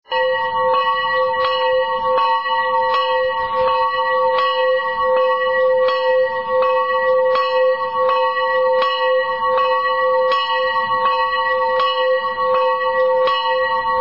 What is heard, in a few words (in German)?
Glocken